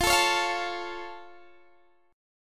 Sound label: F Augmented, Guitar, Open D